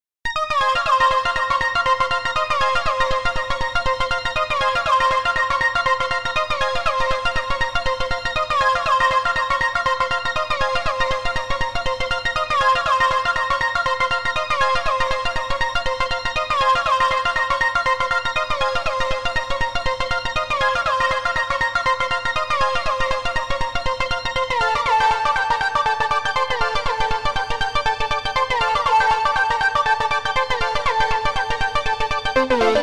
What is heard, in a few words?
Pigments A Little Bit Of Sequencing